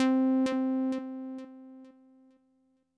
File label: synth note, Fmin; Big Fish Audio; Hardcore Breakbeat Action; Takin It Deeper